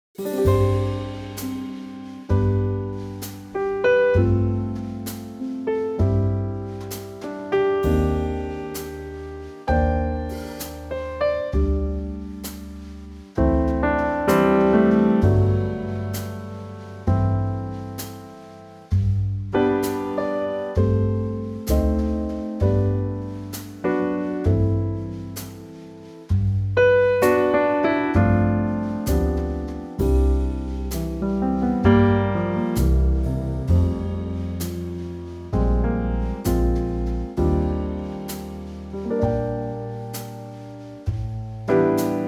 G Trio

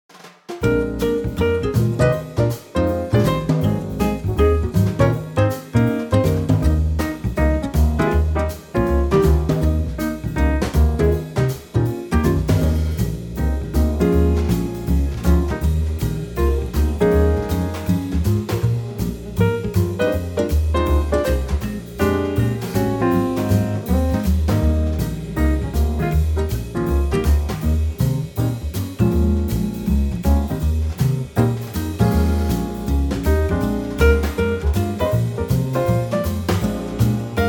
Eb Quartet

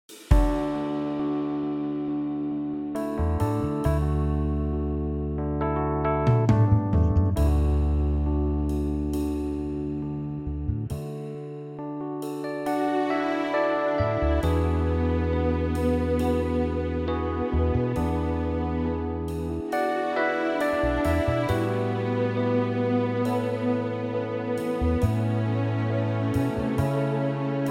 D orchestral

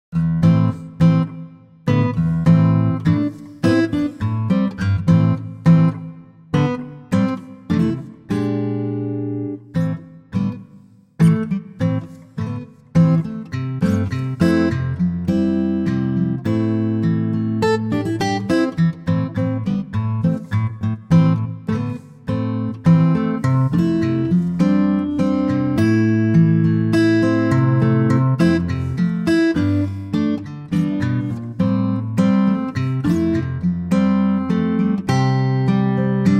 C Acoustic Guitar

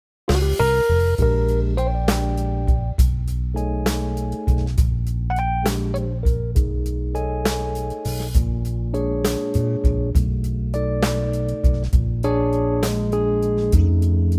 smooth; Bb

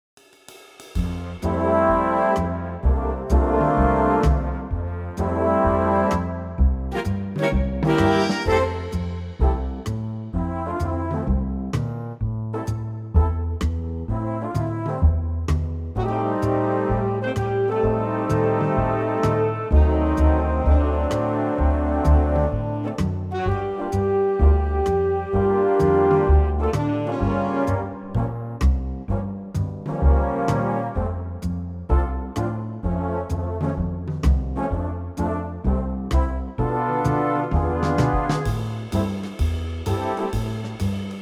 Big Band

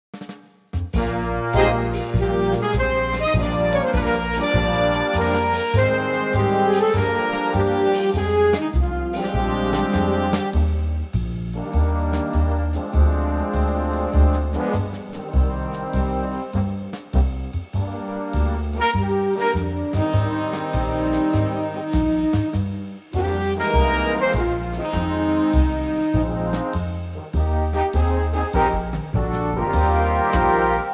Big Band Eb

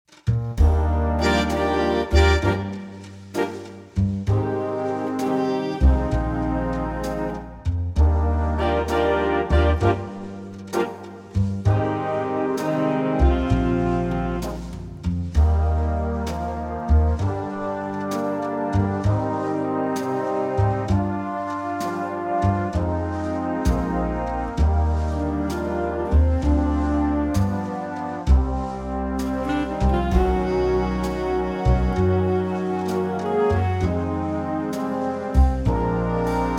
Big Band Eb

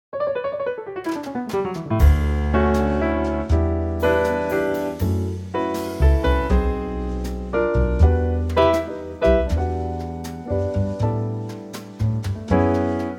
D Trio

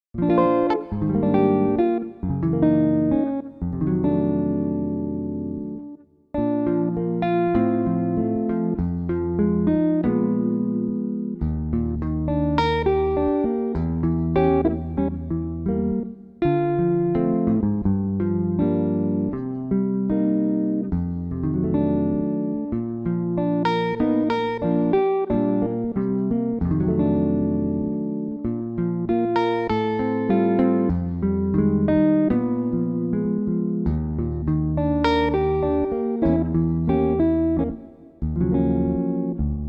Bb w verse Gibson Guitar

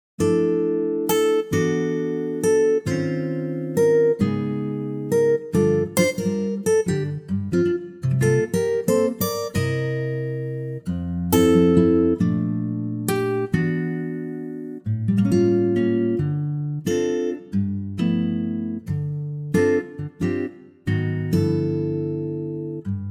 F Ac Guitar

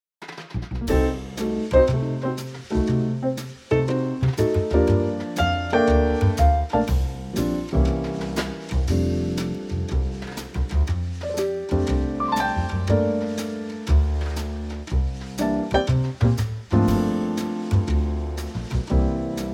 F Trio, Backing Tracks for Male Vocals

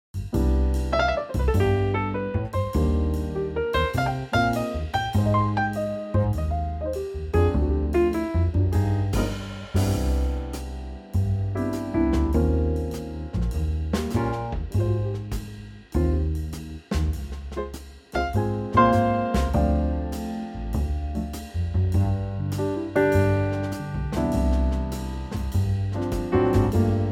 C Trio

